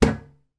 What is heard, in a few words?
bathroom pedal bin metal close; household; zapsplat